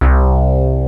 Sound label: REZ BASS, PEAVEY DPM; OTHER SYNTHS; Rubber Chicken Software Sample Bank